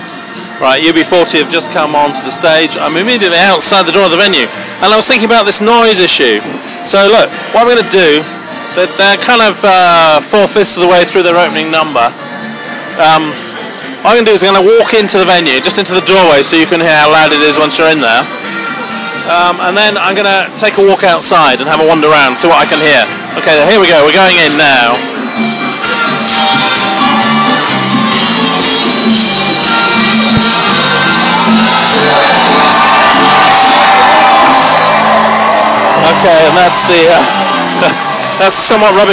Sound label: noise